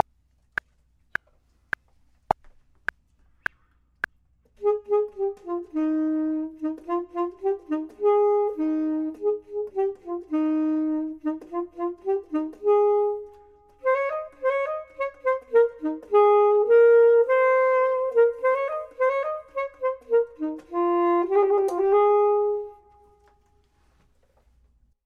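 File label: top, Saxophone and Clarinet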